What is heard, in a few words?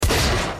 hit; csgo